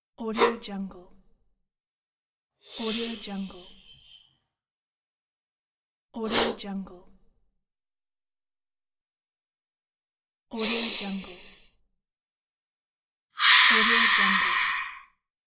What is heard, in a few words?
steam